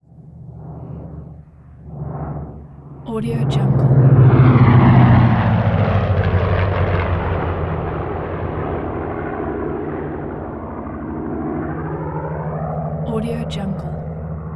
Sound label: b25 mitchell prop plane twin engine; prosoundfx